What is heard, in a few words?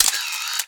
Camera Shutter